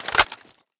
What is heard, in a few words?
mag in, gun; explodiumgun